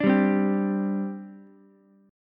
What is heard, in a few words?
G Suspended 4th, Ukulele; strummed; 7,7,8,10; JGuitar